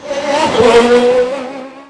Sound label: CAR PASS; AKAI S-Series CD-ROM Sound Library VOL-8; SFX